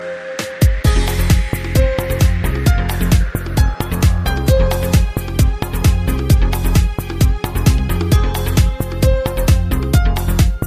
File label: Super Piano Music